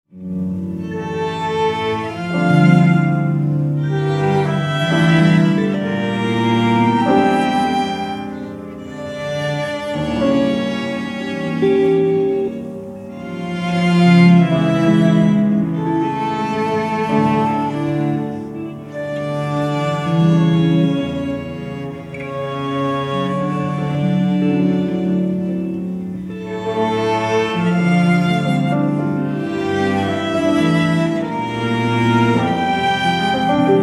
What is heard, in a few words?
unison line chords; Sinfonietta